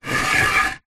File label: foley wood plank long drag hard ground; zapsplat